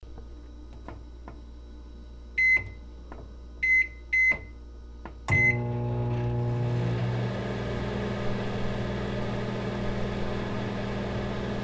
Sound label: Microwave Cooking Running